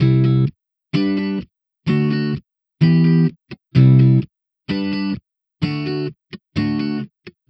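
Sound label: Electric Pop Guitar 128BPM G major; Vengeance - Essential Clubsounds Vol.4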